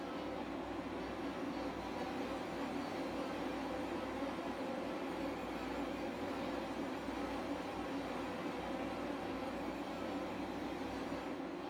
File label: Hunting Music